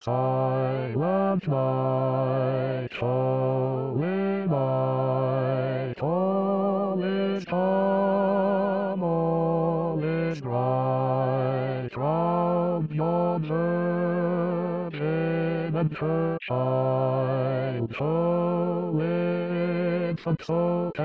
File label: Basses